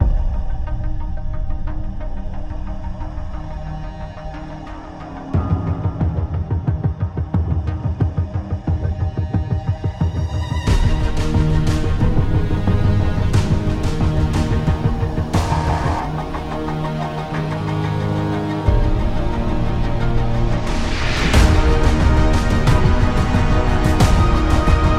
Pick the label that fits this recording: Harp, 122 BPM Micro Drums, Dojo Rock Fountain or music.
music